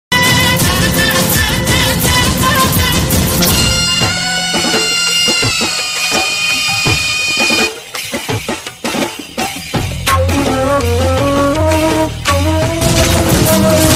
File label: climax ringtone; pudhupettai